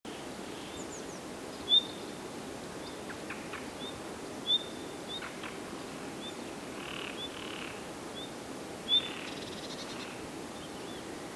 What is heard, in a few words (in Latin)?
Luscinia megarhynchos; SETE